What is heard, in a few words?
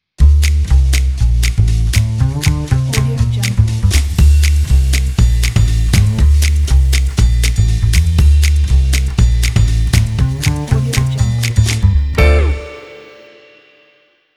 jazz logo